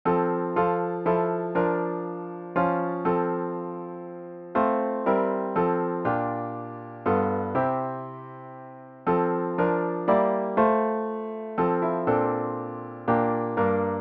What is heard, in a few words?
piano only